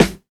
tight acoustic snare sample F sharp key, Royality free snare single hit tuned to the F# note